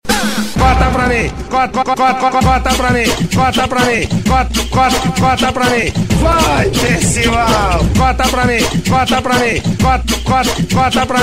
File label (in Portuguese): funk corta pra mim; marcelo resende